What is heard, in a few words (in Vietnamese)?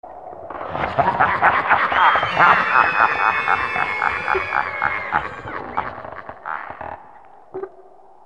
cuoi cua ac quy